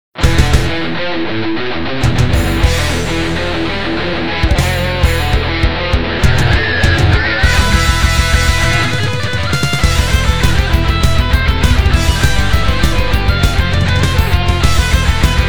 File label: Hard rock ringtone; Nokia; audio themes